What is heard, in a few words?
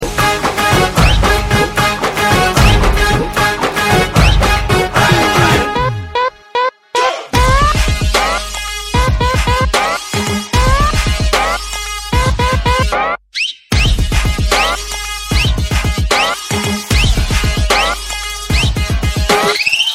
Song Instrumental Ringtone; Baaghi 3